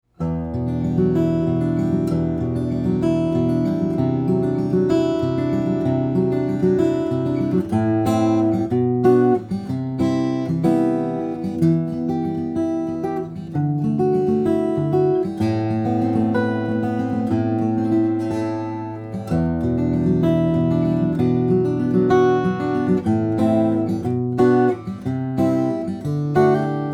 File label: lucas pavilion sweep, steel string guitars flattop